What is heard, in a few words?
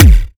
CLEAN COMPUTER TIGHT KICK